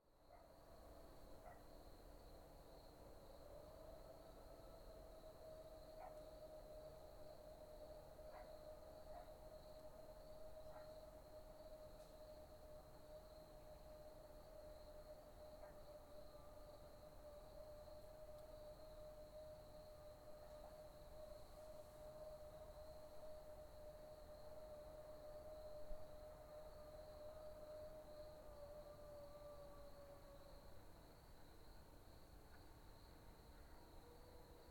Erie Valley Howl, Suburban Sonoma ambience; allmedia sound; Cinematic Ambiences 01

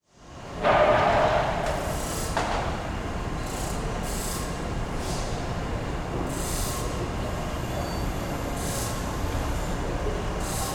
Industrial Warehouse Mid Perspective

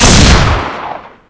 sniper fire, weapons; hl2

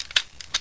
flare gun reload; hl2

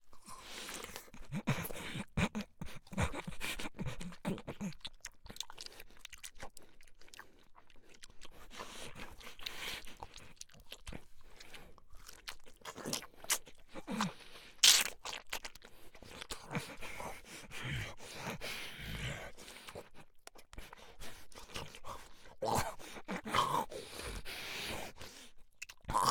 Zombie biting neck guts; DsoundE; House of Horrors

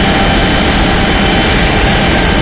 wind blow; hl2; combine gunship

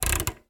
Electronic Knob Multi Turns Slow; Bart Kamski; Clicks & Switches

